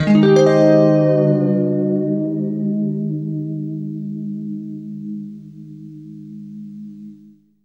FLESH CHORDS, Guitar

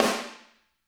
SD, Drums; Bob Clearmountain Drums I

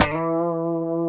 E 3, guitars; COMPR STRAT; Spectrasonics; Hans Zimmer Guitars VOL-1

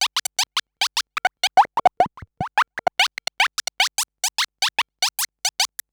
Droids

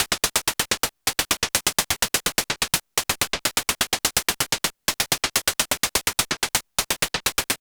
Anabeat Snare

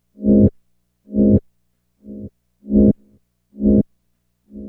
Keyboard

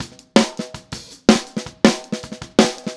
Snare, 162 BPM; Drums; Drums from the Big Room The Mixes; Take Your Toms Off; Verse

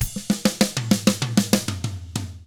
HARD ROCK, Drums; 96HARD ROCK

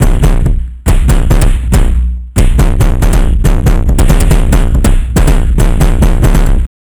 Structured Rhythm, IDM Rhythms

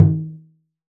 Surdo Open H, Percussion; Roland BK-5

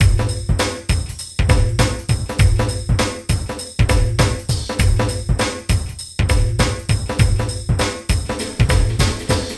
JACK DIDLE 100, LOOPS

